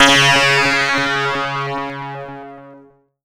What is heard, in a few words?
C#, Synclav FM; Big Fish Audio; SPACE1